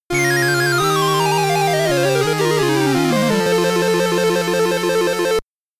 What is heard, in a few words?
Stage Clear (SID Stereo); strider; commodore 64